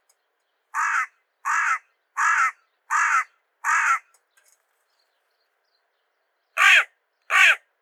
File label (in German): Nebelkraehe Corvus cornix